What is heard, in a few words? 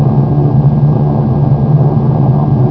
eng; BOMBER III™ (DEMO)